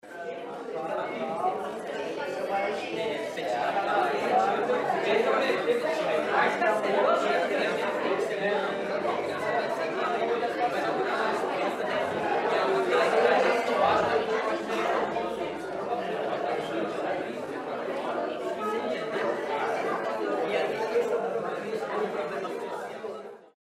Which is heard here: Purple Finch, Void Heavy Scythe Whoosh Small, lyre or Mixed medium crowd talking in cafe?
Mixed medium crowd talking in cafe